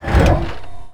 constructor tank pull

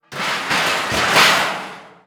explosive objects; World of Tanks

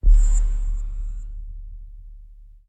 mission failed

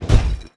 impact metal light

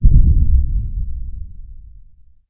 Amb Destruction; Red Orchestra Ostfront 41-45

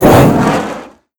fire pipe burst; Explosions

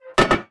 Vehicle Hatches; Red Orchestra 41-45

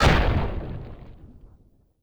fire distant; Rust

